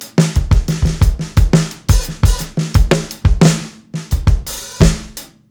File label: 87 FUNKY BREAK, drums; Basement drums vol 2